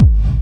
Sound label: Bassdrums Dirty, Kicks; Vengeance Samples